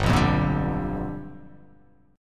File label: G 7th Sharp 9th G